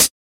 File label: dampened hat one shot g sharp key